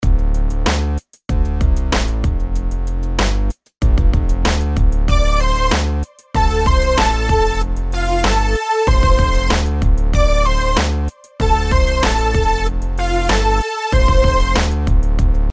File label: rhythms eighths